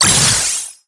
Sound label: Swimming Collision Booster; MiniGames